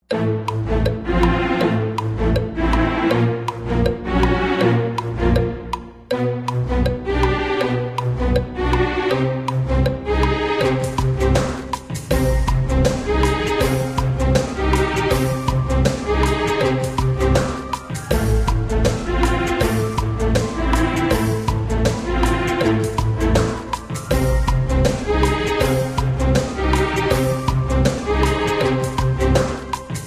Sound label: In Game Music (30 Second Countdown); Kahoot